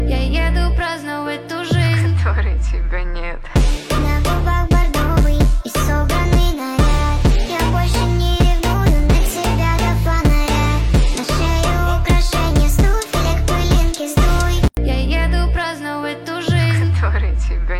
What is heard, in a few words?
Phonk Remix